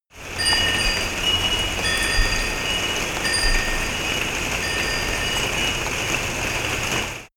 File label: rain and wind chimes